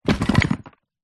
luggage bag kids toys plastic wooden inside set down on carpet; zapsplat; foley